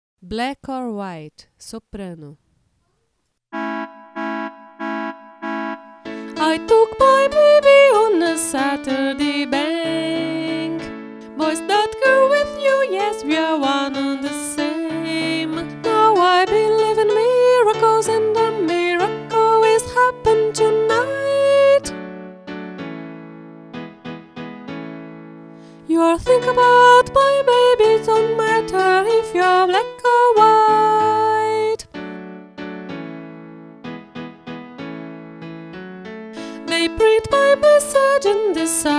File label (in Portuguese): black white naipe soprano; 4 VOZES